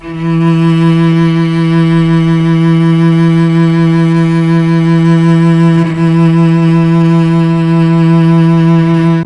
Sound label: E2 CELLO, mellotron; CHMBLN CELLO